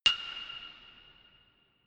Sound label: notification glockenspiel creepy single high